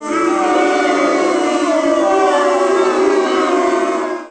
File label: booing; gameplay comment danger